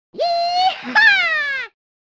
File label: yeehaw